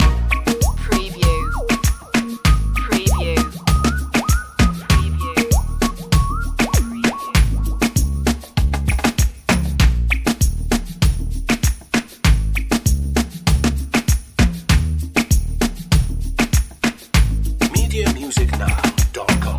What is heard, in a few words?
la chica loop